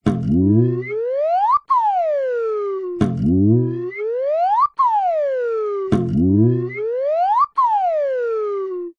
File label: cartoon bounce spring trampoline ascend descend whistle multiple